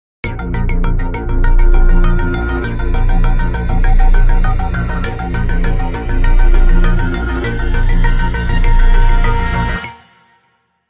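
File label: Crime Sting, No Percussion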